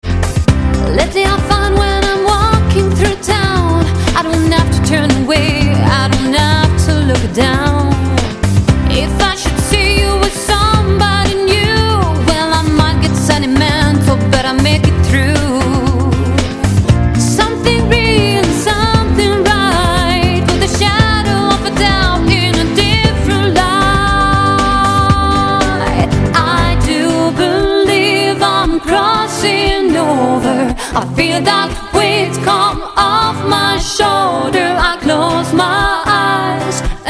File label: chant